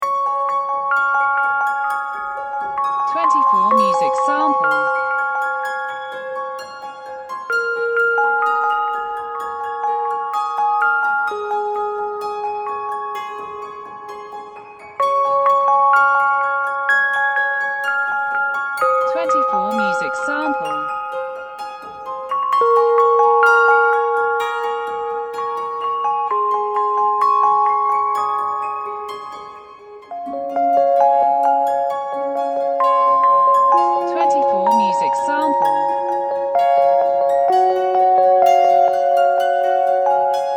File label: epiano